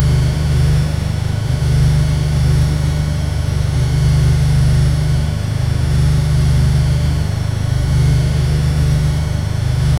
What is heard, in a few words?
it produces a sound like, space station